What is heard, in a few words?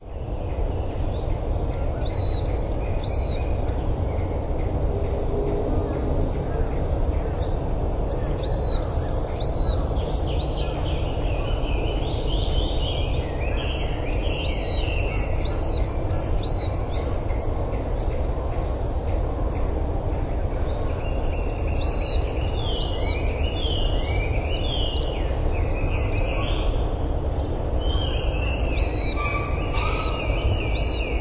varied tit